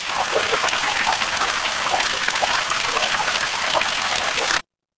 water stream

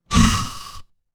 cartoon poof

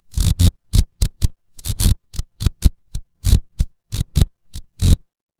fire electric arc

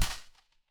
impact slam

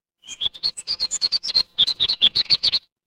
animal bird

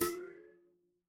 cartoon boing

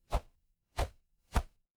paper fabric cloth whip

